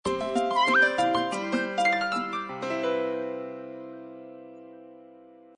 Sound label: Power Off, phonetones system; Fly; ST305